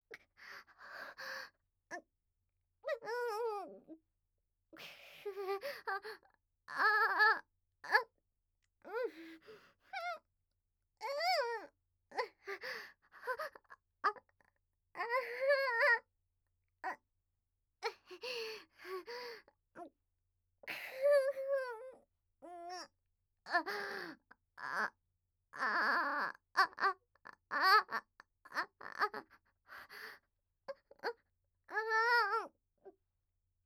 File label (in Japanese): 喘ぎ